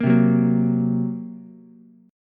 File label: Bb Suspended 2nd Sharp 5th, Ukulele; strummed; chord shape; JGuitar